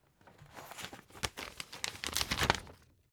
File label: spring binder take document